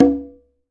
Conga Open, Perc; LA Perc; Akai MPC-500

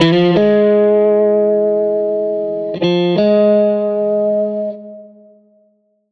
Strat Guitar Loop

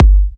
G, Kicks; markus hakala

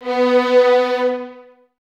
Violins Detache B 3; Miroslav Vitous; String Ensembles

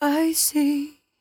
57bpm Am, Velvet Vocal; Zero-G

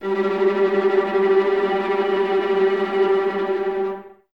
Violas Tremolo, String Ensembles, G; Miroslav Vitous

